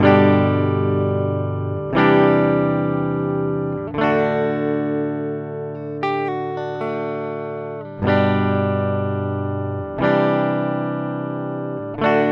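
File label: UA710 guitar G12; Universal Audio 710 Testbericht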